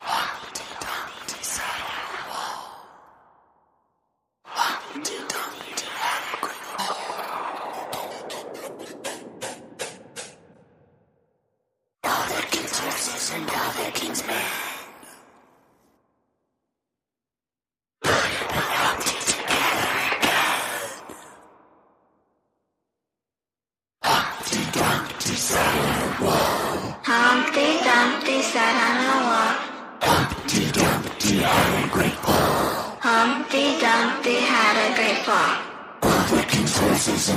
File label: Humpty Dumpty Horror, Vocals Only